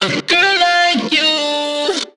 phrase Bb, Vocal

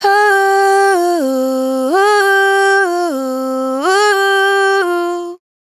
riff Fm, Vocal, Dry Vocals; Raw Vocals; Slate Digital Omnivox Vocal Sample Pack

